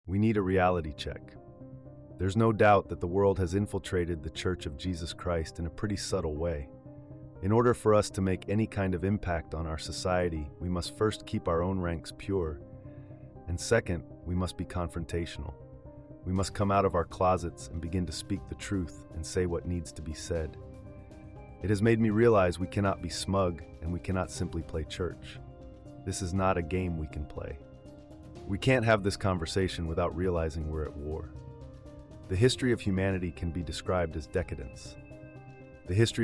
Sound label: music